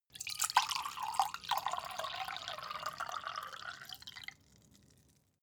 glass pour ring; soundpool

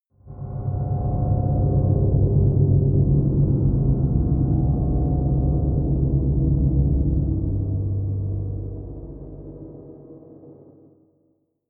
atmospheric drone pad sub; musikelemente; drones

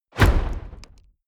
fire whoosh small; swooshes-swishes

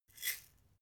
herbs tilt fast; soundpool

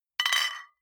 flip-top bottles clink multiple; berufe; gastronomie

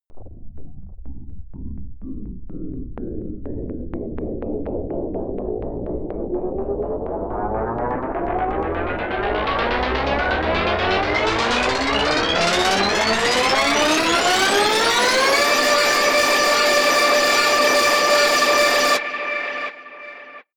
tape machine noise spin up, Risers; whooshes rises

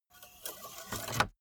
car bmw e46 exterior oil rod insert

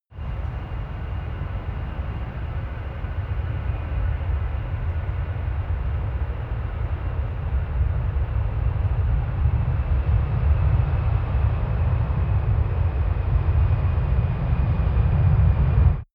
plane incoming land; flugzeuge verkehrsflugzeuge; verkehr transport

